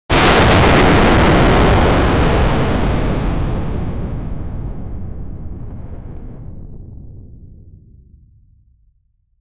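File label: powerful plasma like explosion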